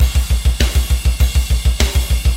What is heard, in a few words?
metal